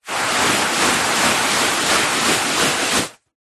household cleaning wiping carpet with dry tissue paper circular motion; zapsplat